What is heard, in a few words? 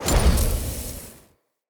Casting Device Charge Heavy Start; Weapons; Player; Fenn; Casting Devices